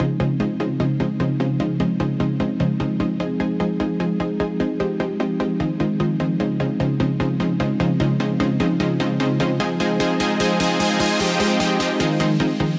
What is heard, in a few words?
Chord Loop 150 BPM F Min, Dubstep; Chord Loops; Synths; Cymatics; Dubstep Starter Pack